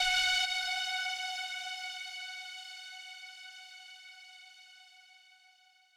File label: lead